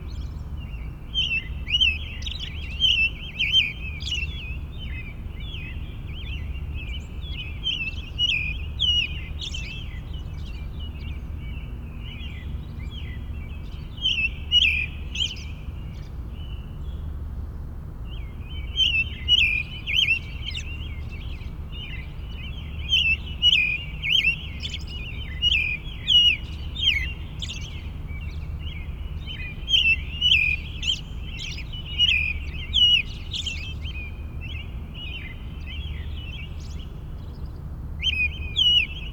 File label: Suburban park morning bird chorus; urban and suburban; boom library